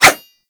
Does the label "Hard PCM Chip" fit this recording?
No